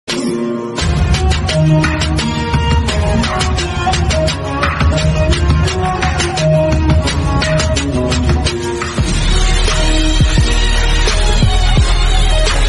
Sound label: Arab Islamic BGM